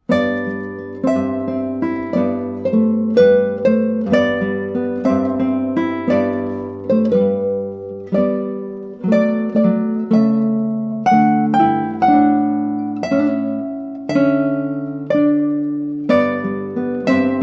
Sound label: Sicilian Mariners Hymn, guitar